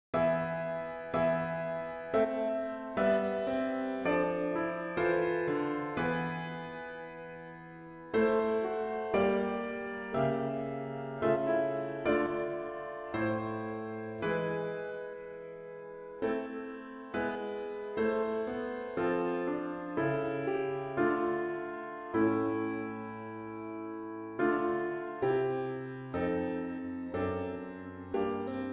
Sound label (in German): Christus der uns selig macht Piano